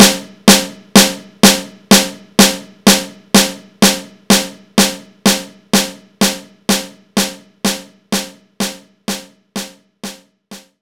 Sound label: Standard Snare